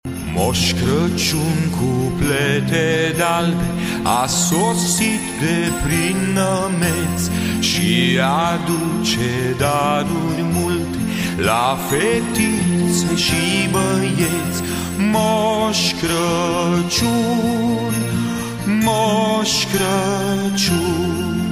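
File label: christmas song; holiday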